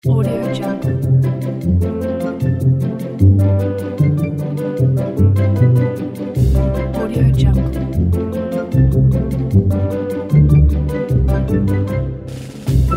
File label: merry childish happiness pizzicato